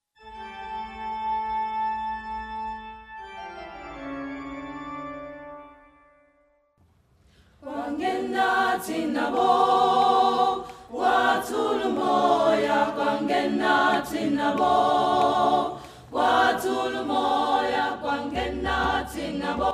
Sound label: SPRING CONCERT; NMMU